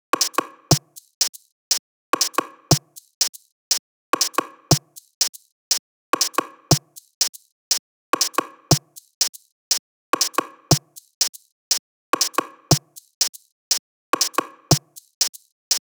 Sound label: tops 120, Drum Sample Pack Loops Top Loops; SD SEISMIC; Slate